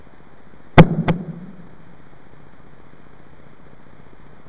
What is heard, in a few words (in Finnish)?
potku